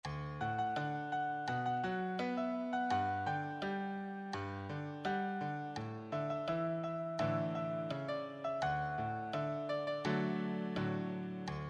solo, piano solo

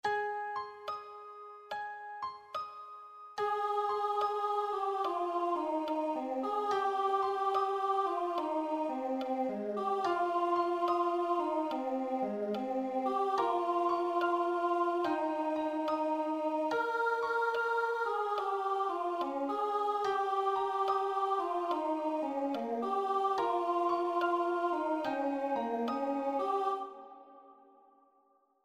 accompaniment, piano

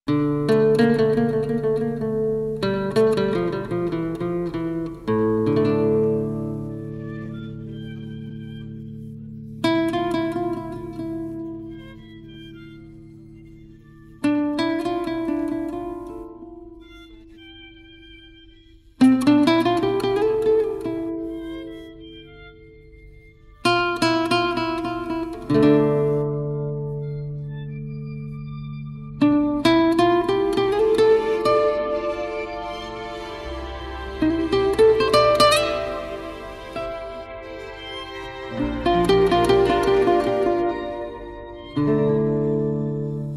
backing track

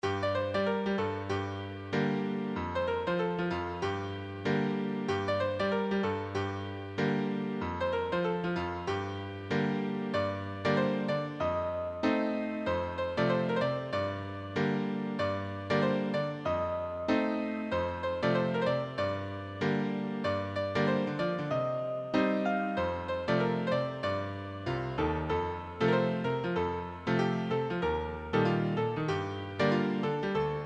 solo, piano

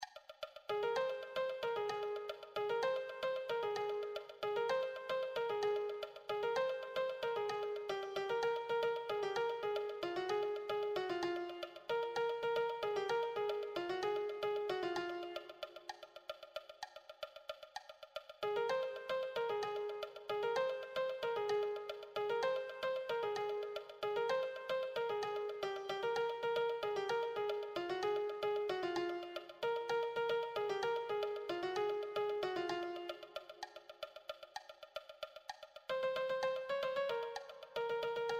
melody

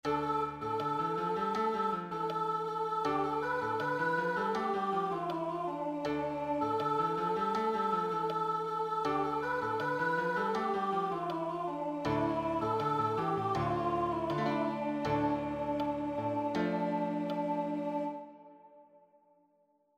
accompaniment

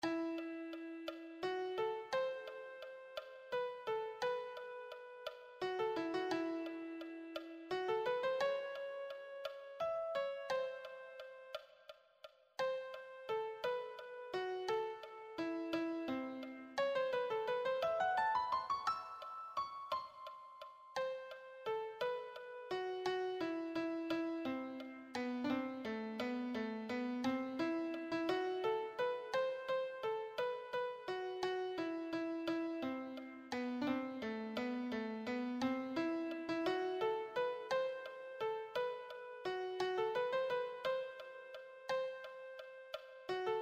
melody, piano

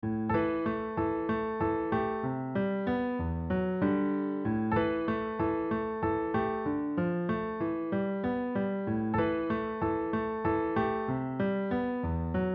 solo, piano; David

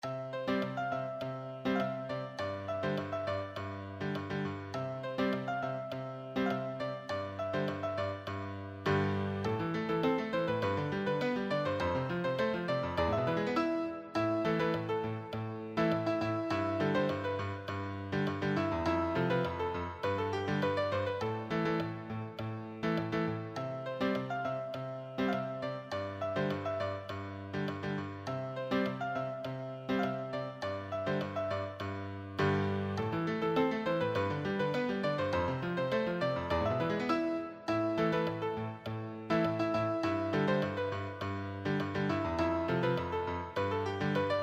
advanced solo, piano

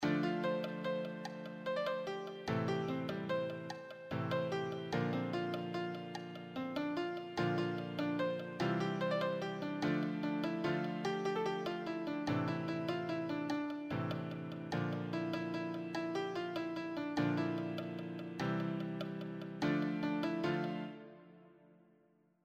easy solo, piano

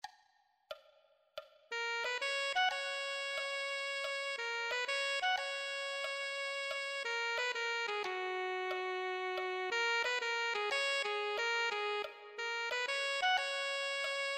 alto saxophone

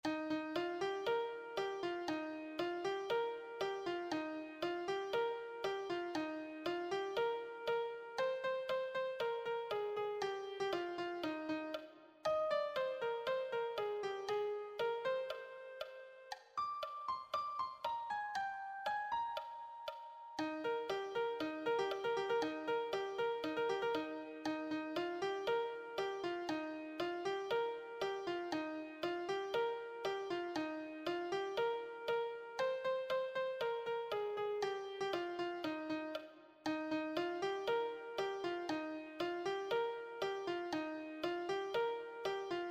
melody

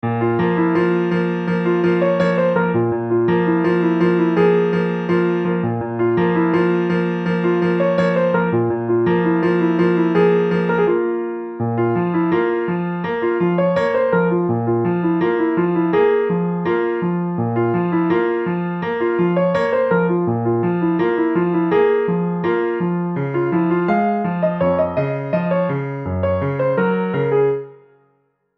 mellaga solo, piano

